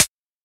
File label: sharp hi hat sound D key, pedal hi-hat sample tuned to the D note; hits one shots; hat samples